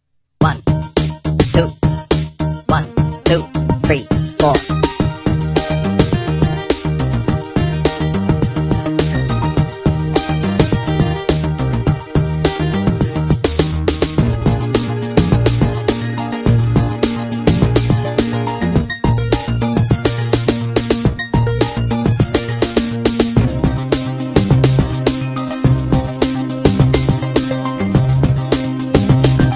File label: Play All The Notes Up Eb